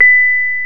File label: PNO, Rhodes Mk.V; Keyboards of The 60's and 70's